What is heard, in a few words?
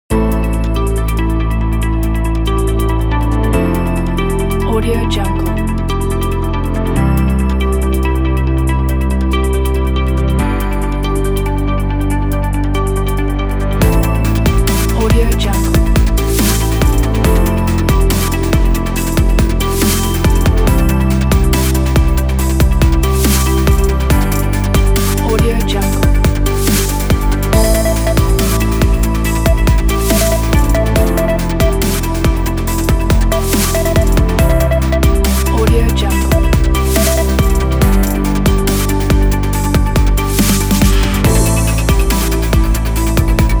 motivational corporate technology dubstep